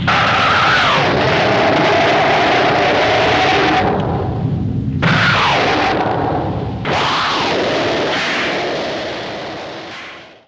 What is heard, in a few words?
King Zaura roar